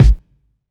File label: tight low end kick drum g sharp key, Royality free steel kick drum sound tuned to the G# note